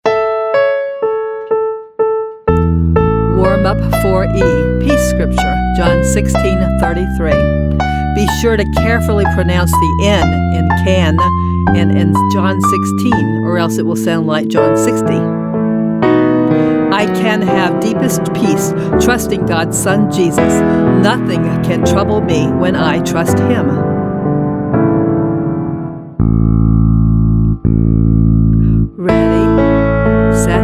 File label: Vocal Warm Up